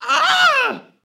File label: man injured scream; soundscrate